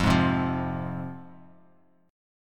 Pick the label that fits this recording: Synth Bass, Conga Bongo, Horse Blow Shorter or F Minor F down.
F Minor F down